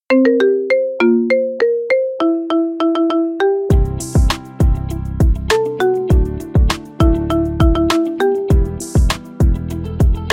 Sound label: marimba remix